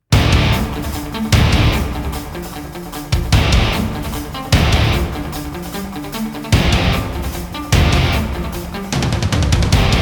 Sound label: No Choir; Gothic Storm Music